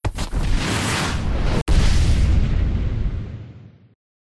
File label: shoulder throw